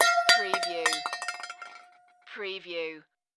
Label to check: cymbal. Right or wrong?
Wrong